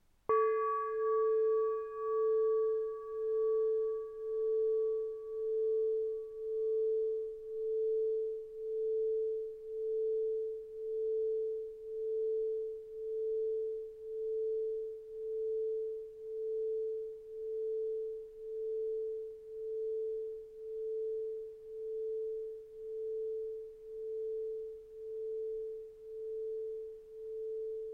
cloche tub 50 A

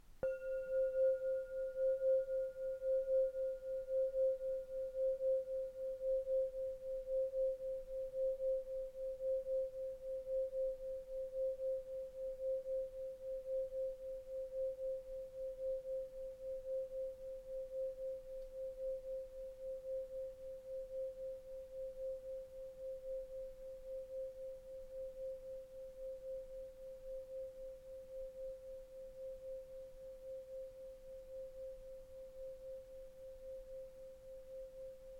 Cloche tubulaire 25mm C5

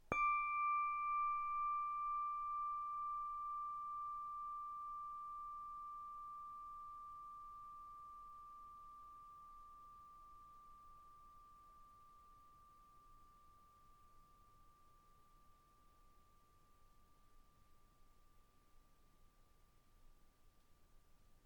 Cloche plate D6

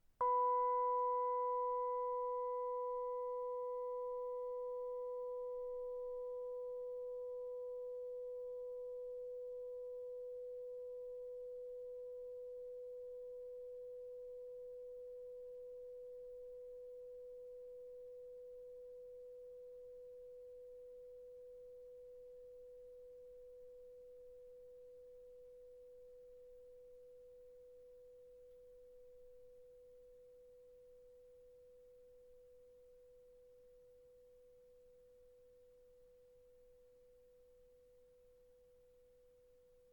Cloche plate B4

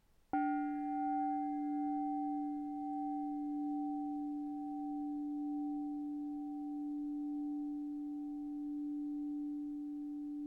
cloche tub 50 D

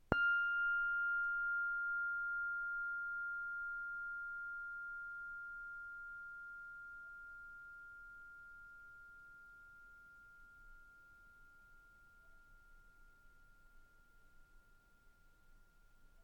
Cloche plate F6

